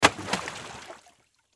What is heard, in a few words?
Water Splash Object Drop Rock; Splashes (Sound Effects:Objects); Blastwave